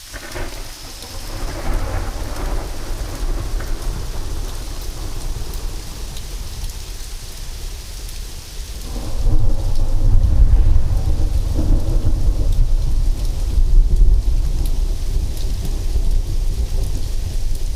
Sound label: long thunder; Rain & Thunder; SFX